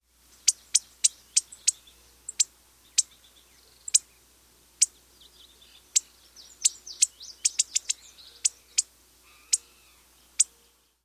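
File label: Lazuli Bunting call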